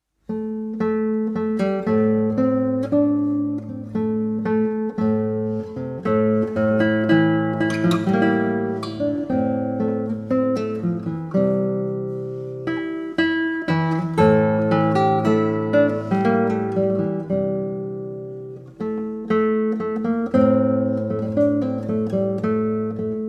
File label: Ancient celtic tune